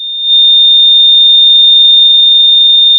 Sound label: Sound of a tea kettle whistling